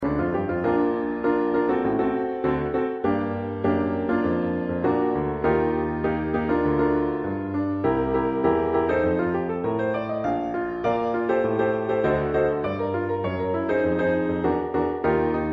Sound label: Piano